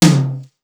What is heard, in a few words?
SDS2000 HT, Simmons SDS2000; SDS2000 Factory3